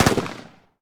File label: gunshot; goon; Blind Drive